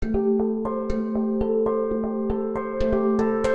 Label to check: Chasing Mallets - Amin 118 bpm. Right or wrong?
Right